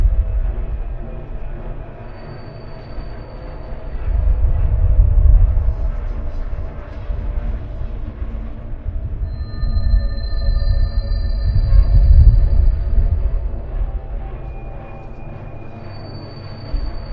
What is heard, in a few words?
Ambient; Mita Near; MiSide